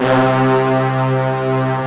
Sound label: RICH BRASS; AKAI S6000 CD-ROM - Volume 1; BrassWind